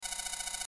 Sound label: Data Processing; Digital; Sound Design; Multimedia; Blastwave